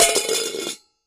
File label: Bowl Med Drop Upside D; barrels buckets & cans